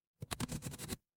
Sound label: office pen